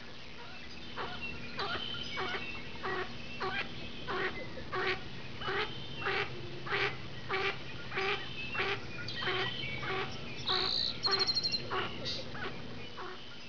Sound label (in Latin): Anas discors; voice